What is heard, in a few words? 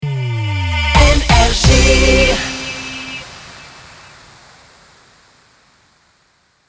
Jingle Rapid Fire; NRJ